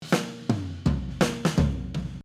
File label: Tom Fill; Control